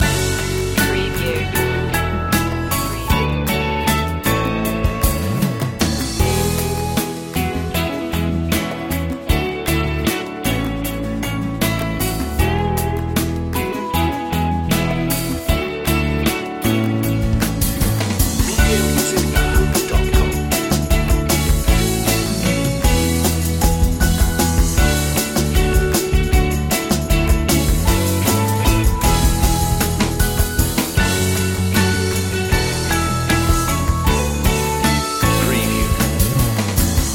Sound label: promising voyage loop